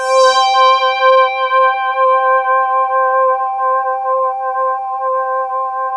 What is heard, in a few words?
SOUNDTRAKS, STACK